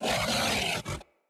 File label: Kubrow Feral Vocal Melee Attack